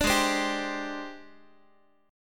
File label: G sharp Major 7th Flat 5th Guitar; G#M7b5 Chord